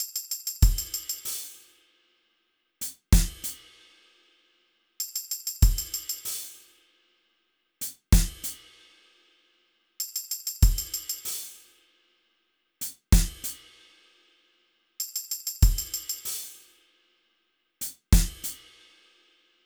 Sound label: drums 96bpm